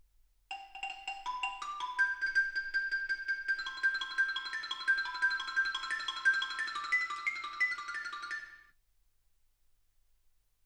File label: xylophone